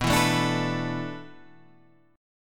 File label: D 6th Suspended 2nd B, chord; D6sus2/B strummed